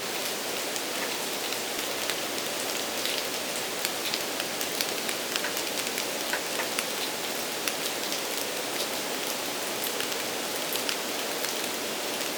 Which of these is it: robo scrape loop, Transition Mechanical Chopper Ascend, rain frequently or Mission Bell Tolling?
rain frequently